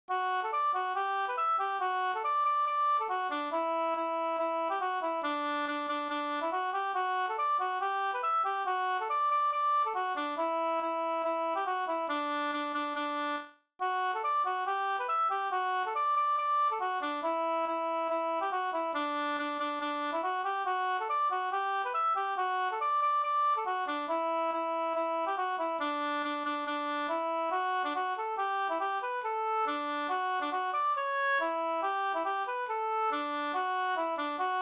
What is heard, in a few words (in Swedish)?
schottis 4-4